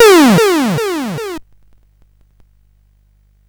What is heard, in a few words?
sid CSG8580R5; hvsc